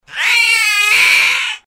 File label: cat scream